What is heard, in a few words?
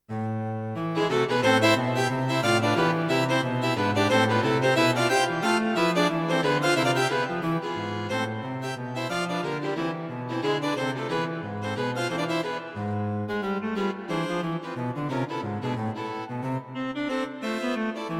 String Quartet; String Quartet for Concert performance